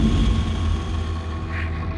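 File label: Music Volcano Light 120; From Dust; PS3; Xbox 360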